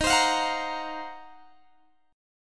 D sharp Minor 6th Add 9th D sharp Guitar Standard, chord; strummed; JGuitar